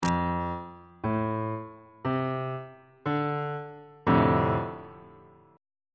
f major 6th chord

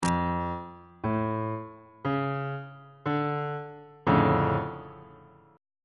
c double sharp minor major 7th 1st inversion

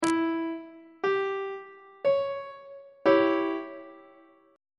c sharp diminished 1st inversion on treble clef

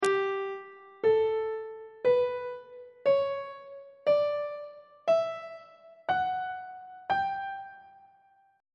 g lydian mode on treble clef

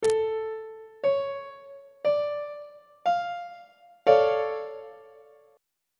d minor major 7th 2nd inversion on treble clef

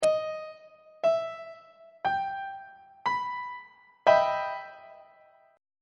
e minor major 7th 3rd inversion on treble clef

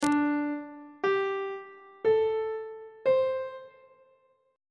d dominant 7th suspended 4th chord intervals; treble clef

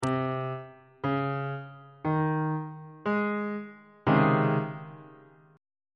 c sharp major 7th suspended 2nd 3rd inversion; bass clef

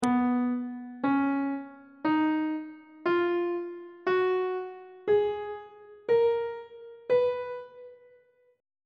c flat lydian mode on treble clef

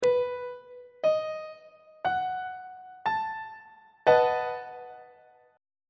a double sharp dominant 7th chord on treble clef

